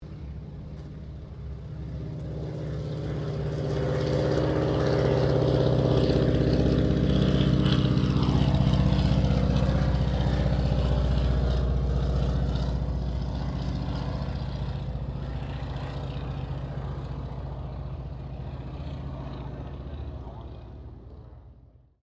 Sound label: AIRCRAFT 1916 SOPWITH PUP REPLICA PASS STEREO; Replica of a 1916 Sopwith Pup; Airplanes; Vehicles; Aircraft Collection